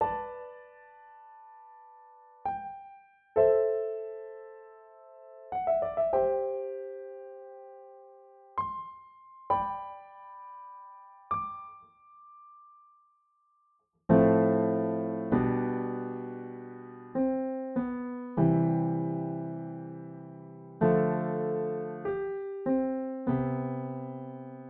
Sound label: Piano Music